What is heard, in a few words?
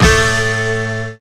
Stabs